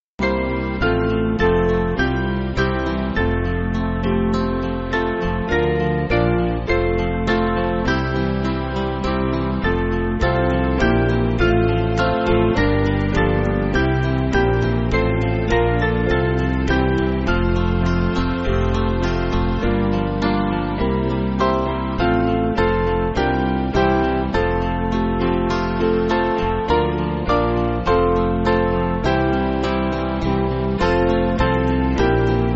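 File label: Band, Music Backing Files for Churches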